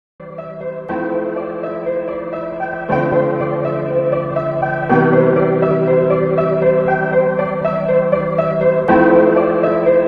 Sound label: Chillout Jazz Vibes